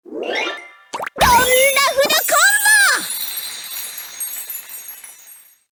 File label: combo